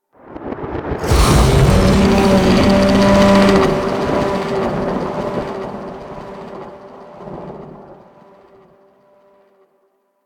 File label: Infested Micro Planet Sun Monster Bellow; Warframe